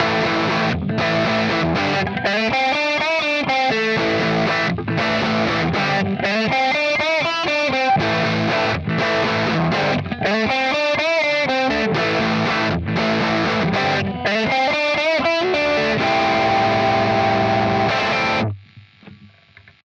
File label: Lead